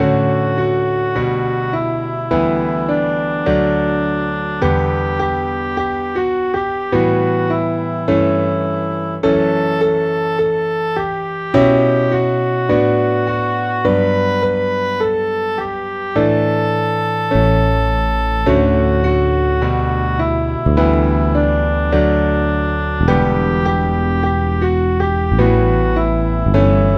piano

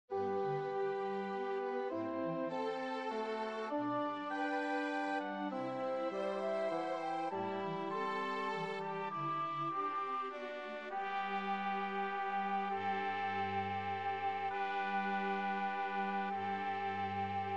orch

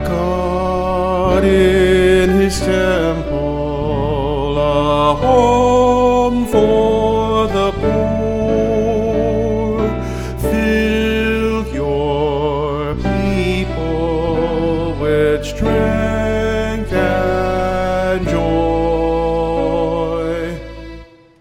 God In His Temple Vox

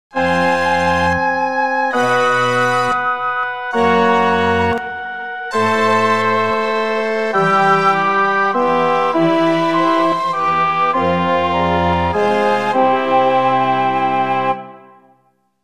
Tutti

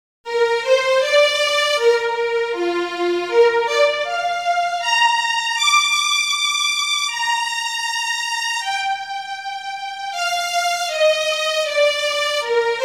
Vln

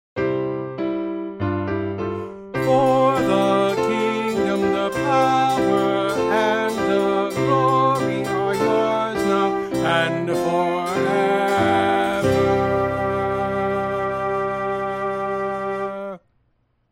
Melody; SM 504 Embolism/Final Doxology; Mondoy Music

